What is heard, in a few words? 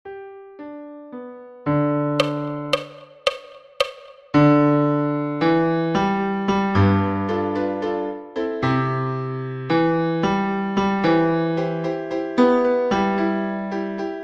amen bass; Eklektika Music